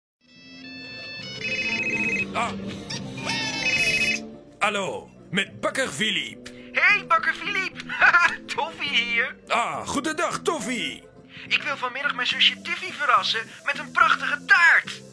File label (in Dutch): Amusementspark Tivoli real